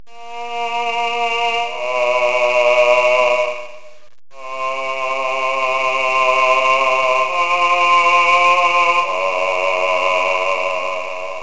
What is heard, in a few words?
bass